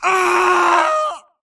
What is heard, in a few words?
grunt electro; Titanfall 2